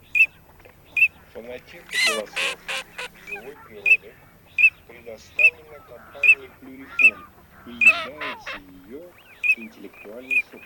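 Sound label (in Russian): Чирок-свистунок Alzavola, манок; plurifon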